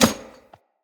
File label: Heavy Grunt Boot Walk Metal, Footsteps; Enemy; Sinecure